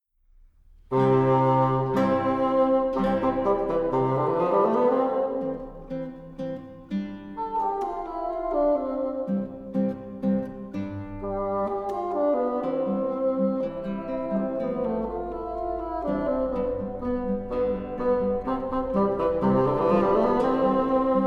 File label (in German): Sonate für Fagott und Gitarre Allegro spiritoso; Carl Andreas Göpfert; op. 13